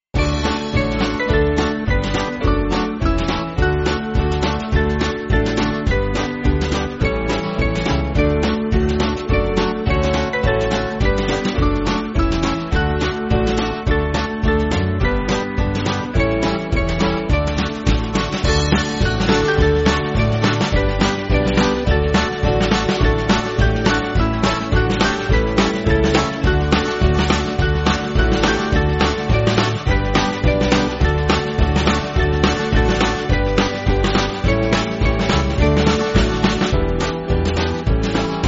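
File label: Band, Music Backing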